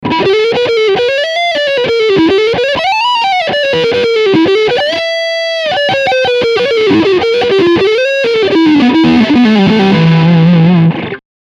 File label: Lick Distortion Front PU